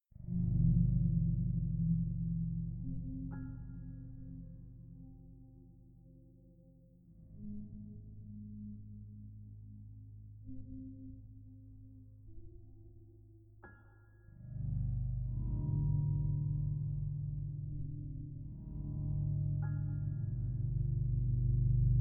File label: Ambiance; ThePainter